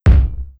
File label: stone rotate; Quboid